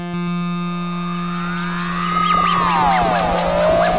Synthesizer Middle C Notes, Pad; TS Synthesizer Middle C Notes

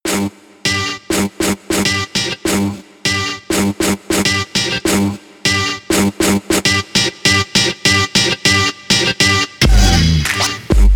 Stomp Interlude

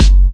Moombah Kick; Moombah Kit; Hip Hop; STYLE KITS (Hip Hop, Etc)

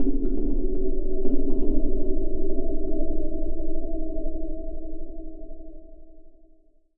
Digi Mass G1, Synth

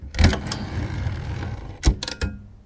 deep internal windup, Typewriter Kit

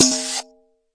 Processed Ethn Perc, ETHNIC and WORLD PERCUSSION

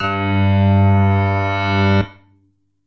Moo Piano G2, moo backwards piano

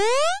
8bit kit jump, 8 Bit Videogame Kit; LO FI and 8 BIT KITS

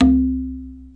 "dha" slide, Tabla

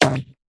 tight hit, Tight n Hard Kit; STYLE KITS (Hip Hop, Etc)

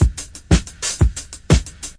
triplet shuffler, 120 bpm, drum loops and breaks

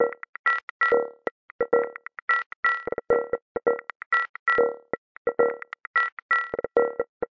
131 rollertechno

